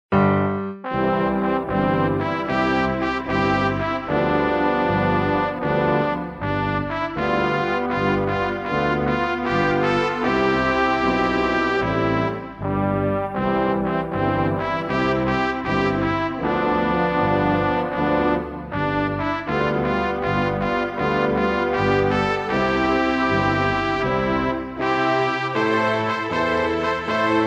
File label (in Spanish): Himno nacional de Angola